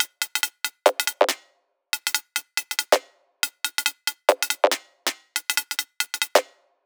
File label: Bpm140 Duck Robot Perc Loop; Perc Loops